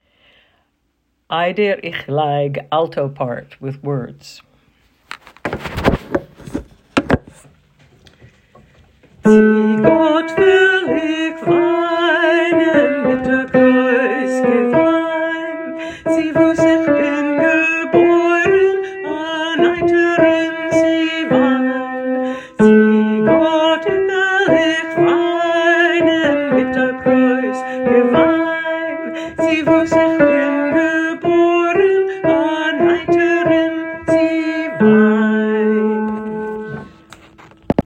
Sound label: alto part, Yiddish Community Chorus; Ayder ikh Layg